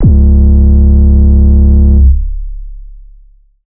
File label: 808 one shot Db; Slate Digital BASSHOLE Sample Pack